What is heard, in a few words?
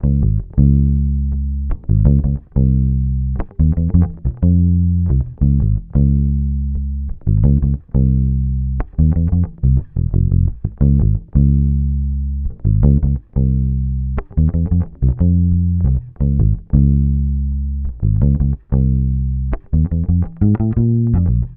Bass loop 89 Fm; Electric Bass Loops; Slate Digital BASSHOLE Sample Pack